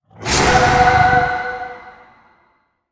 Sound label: angelic execute; Smite; Thanatos